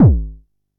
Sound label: Bassdrum; Jomox Xbase09